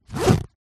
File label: cool bag fabric zipper